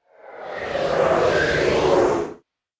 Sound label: Scifi Afterburner, spacecraft